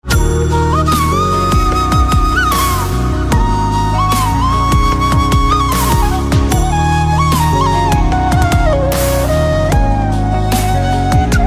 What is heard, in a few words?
Flute Instrumental Ringtone